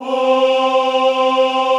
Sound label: OHH C3, Classical Choir; Best Service; Extended Classical Choir